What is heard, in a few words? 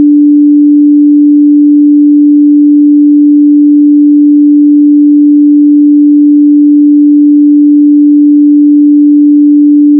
pass 5th hz 440